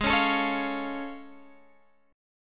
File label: A Augmented Major 7th G sharp